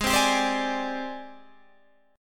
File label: G sharp Major Sharp 11th, Guitar Standard; G#M#11 strummed